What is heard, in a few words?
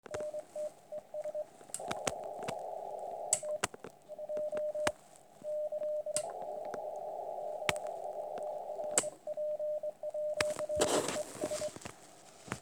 Ant Noise 30m